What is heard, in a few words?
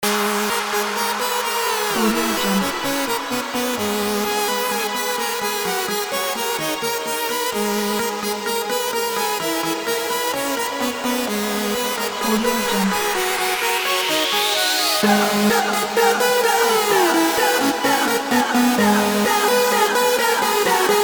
edm festival